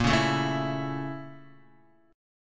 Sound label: Bb Minor Major 7th Flat 5th, Guitar; BbmM7b5; strummed; x,1,1,2,1,0; JGuitar